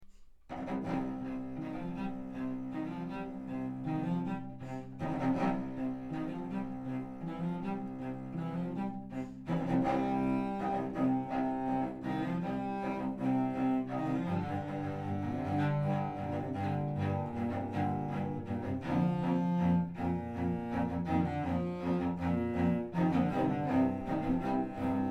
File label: Cello Ensemble; Film Score; 4 Cellos , Cello Ensemble